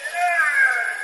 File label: jeeee